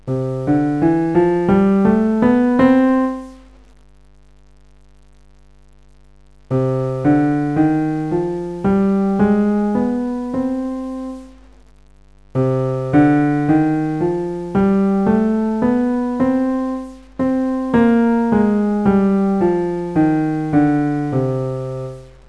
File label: diatonic